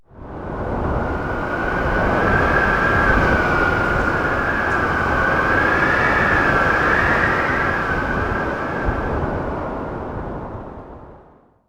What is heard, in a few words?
archeology event wind ruins; ancient relics